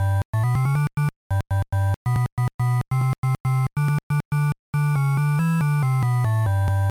Jingle, gba; backyard baseball 2002

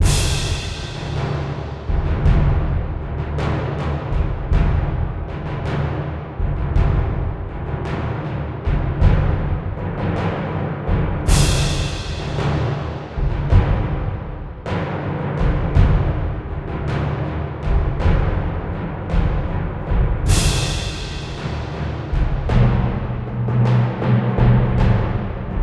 Battle Sequence; mass effect 3 citadel gamerip 2013; Arena

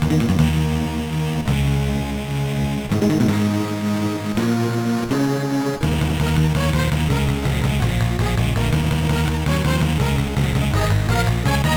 Jingle; king of fighters ex the neo blood 2002 gba

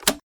switch on

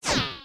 SFX UI Disconnected; Mario Kart 8

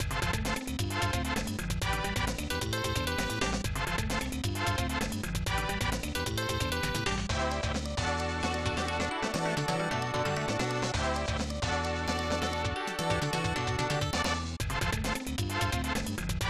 Game Start; umizuri meijin 1994 snes

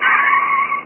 Skid; Buggy; PS1

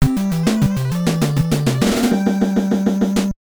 Game Start

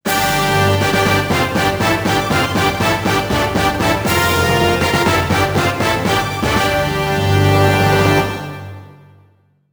First Place Fanfare; Dokapon Kingdom; Wii

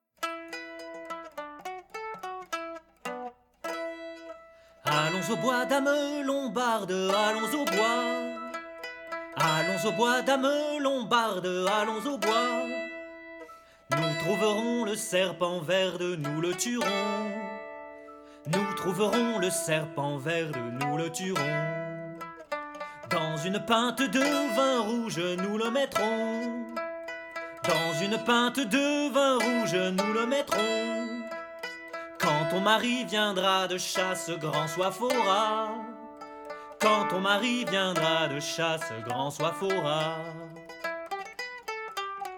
Tavern; Assassin's Creed Unity

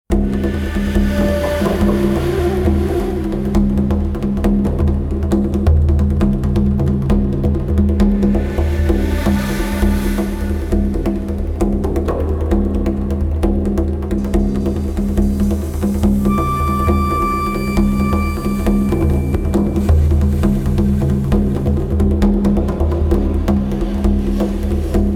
America - War - Tribal Breath; Civilization V

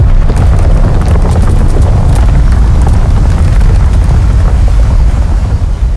footstep rumble

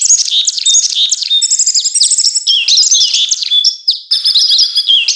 SND AMB BIRDS; franklins birthday surprise

